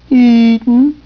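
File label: eating again; Wayzata Technology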